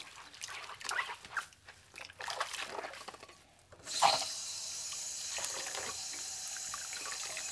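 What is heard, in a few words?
wringing clothes; zdimani hadry; Mafie 1 cz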